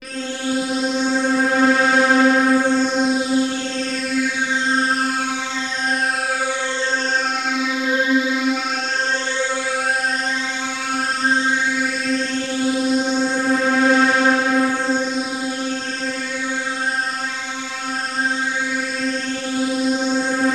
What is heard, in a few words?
Access Virus B; Synthworx